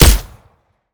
sup, usp; weapons; Benny Placeholders

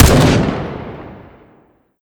fire, m16 weapons; Urban-Coalition; Decay; arccw ud

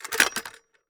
lid open, weapons m60; Urban-Coalition; Anarchy; arccw ue

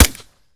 fire sup, glock; weapons; Urban-Coalition; Decay; arccw ud

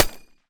mech, m249; Urban-Coalition; Anarchy; arccw ue

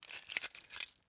belt adjust, m249; weapons; Urban-Coalition; Anarchy; arccw ue

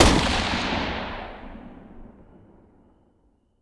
fire dist, m16 weapons

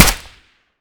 fire 762 sup, mk23; ARC9 uplp fesiug

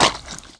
flesh, violence; Benny Placeholders

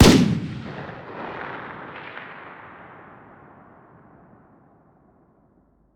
fire dist, m16 weapons; Urban-Coalition; Decay; arccw ud

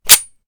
slide drop, 1911, weapons; Benny Placeholders

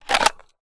holster, glock; weapons; Urban-Coalition; Decay; arccw ud

